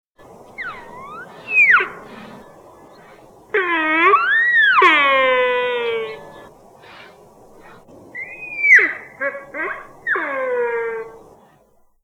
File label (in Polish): jelsik